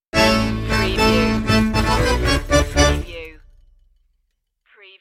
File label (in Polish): obertas stinger